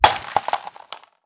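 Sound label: glass Shatter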